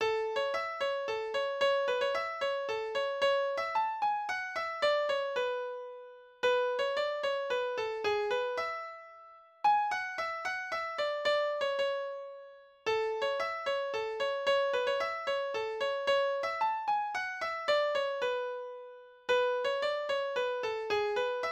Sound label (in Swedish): Bodapolska